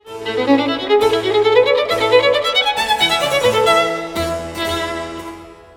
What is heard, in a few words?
cadences